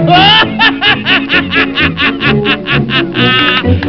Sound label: Laughing